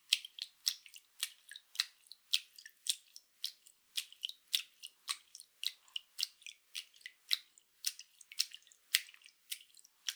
ピチャピチャ, 水音効果音

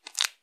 くちゅ音単発

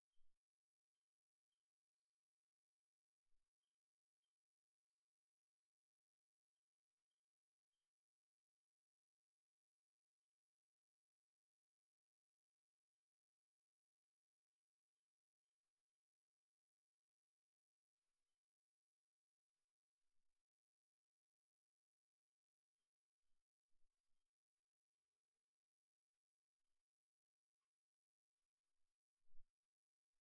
鳥の声, 家の中の環境音; KU100

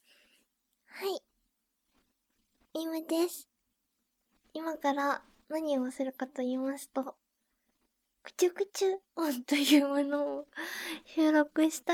近接録音 ステレオ; アソコのくちゅくちゅ音

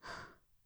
ブレス ミディアム

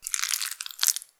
挿入or引き抜かれ終わる直前 普通; 挿入or引き抜く

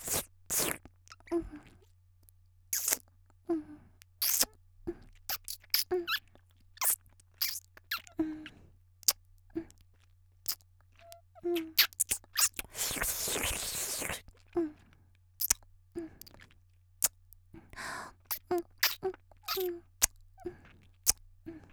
「フェラ」キスしながら30秒; 綾波系少女

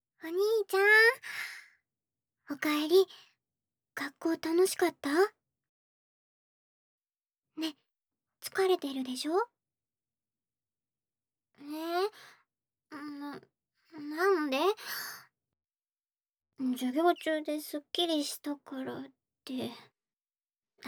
耳たぶマッサージ; 前半癒し

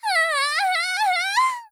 はああああん！, 姉

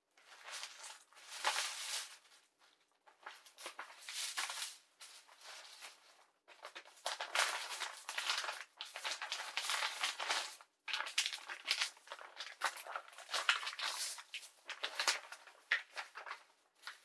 ビニール袋を漁る

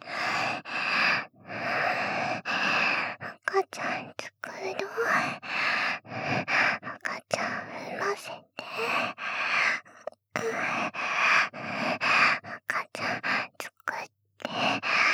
喘ぎ; 赤ちゃん作ろ♪

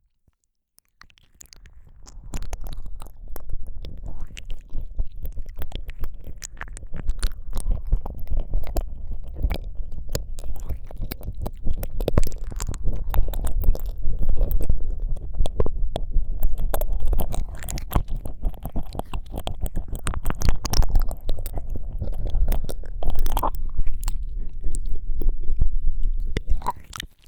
奥をぐりぐり両耳舐め, ASMR

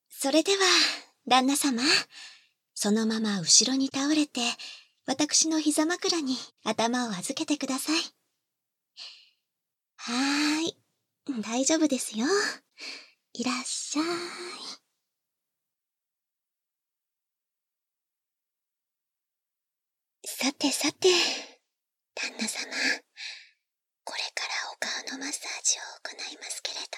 顔→フェイシャルマッサージ（バイノーラルマイク収録 効果音あり小さめ）

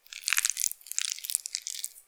普通, 挿入 or 引き抜かれ終わる直前; ■挿入or引き抜く

